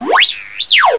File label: whistle ringtone